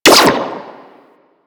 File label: Sentinel Laser Rifle Fire; DE; Warframe; Weapons